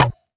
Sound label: Percussions